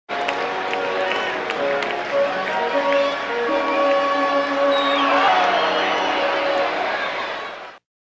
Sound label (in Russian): hokkej muzyka aplodismenty nachalo